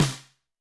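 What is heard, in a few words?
Snare Wood, Alesis D4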